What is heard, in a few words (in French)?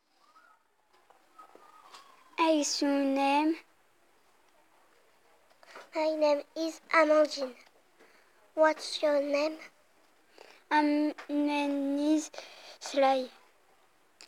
vocal